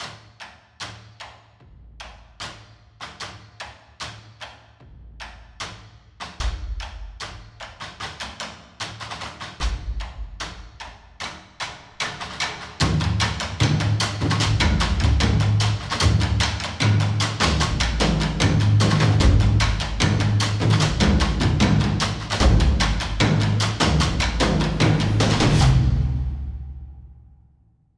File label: cinematic percussion trailer; Gregor Quendel; cinematic trailer music